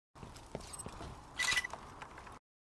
Schild aufgeladen; borderlands 2

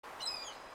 moewe; Stardew Valley

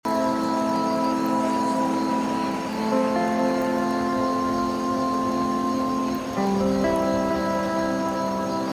nacht; The Flame in the Flood

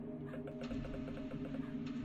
gehen normal; Machinarium; gamesoundopedia

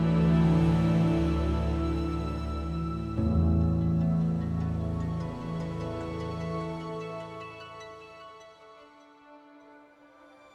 dynamische musik; hollow knight

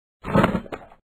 buch in das regal stellen sound; The Dream Machine

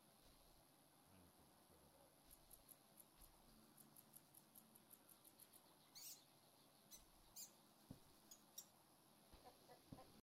raum tag; The Witcher

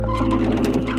wagen; Machinarium; gamesoundopedia

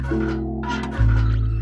rohr; Machinarium; gamesoundopedia

